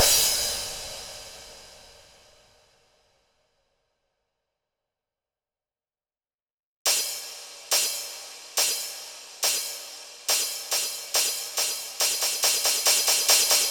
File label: Crashes